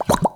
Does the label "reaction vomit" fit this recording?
No